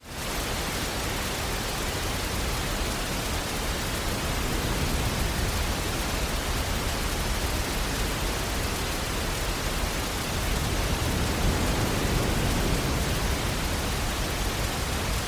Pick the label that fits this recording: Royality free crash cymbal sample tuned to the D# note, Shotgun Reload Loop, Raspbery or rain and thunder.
rain and thunder